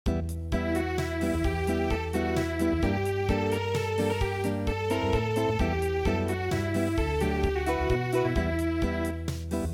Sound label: Unplugged; damavu yale